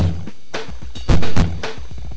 3 drums

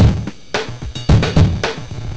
3 drums

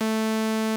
input saw

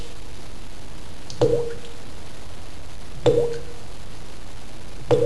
drippiness; the spell is vanquished by another spell

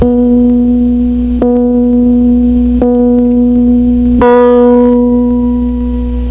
four tone

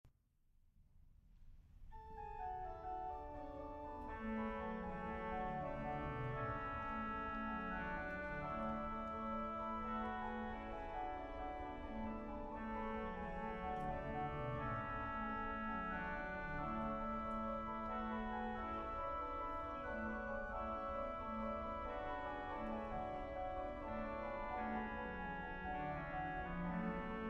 organ; Bach

